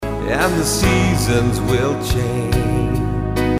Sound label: Seasons